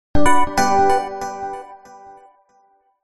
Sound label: multimedia alert synth bright positive; zapsplat